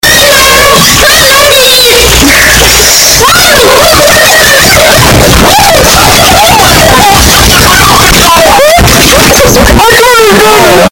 angy kid

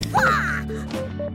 bwaaagh; rabbids

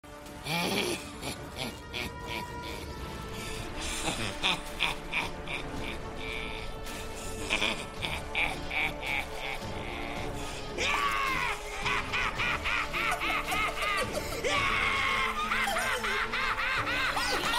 laugh; zim

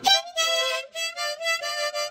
acoustic rizz

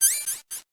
confirm; mgs

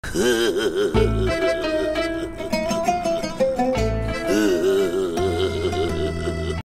goblin evil laugh

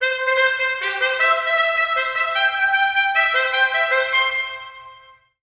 fanfare ios

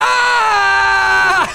aaaaaaaahh

